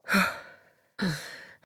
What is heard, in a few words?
Downed Breathe; Enemy; Lady Deora; Battle Barks